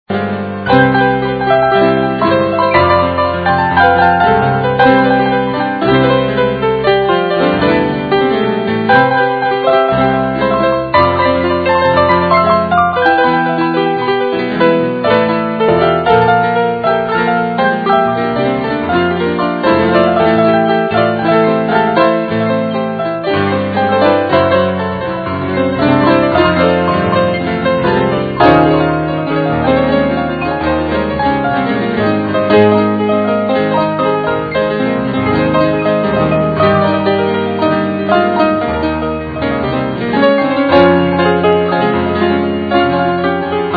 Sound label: casio